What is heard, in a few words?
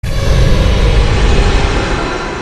teleport; tvman